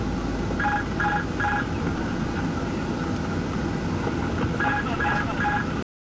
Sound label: alar